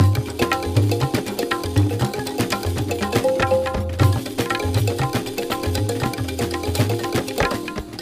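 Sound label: music world music action part d percussion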